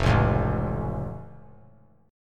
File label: F Augmented F; strummed